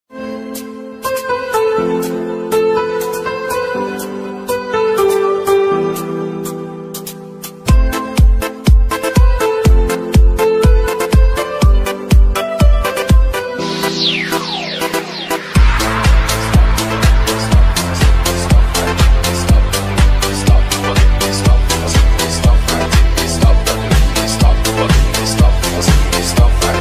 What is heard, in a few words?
songs remixed from the 70s 80s 90s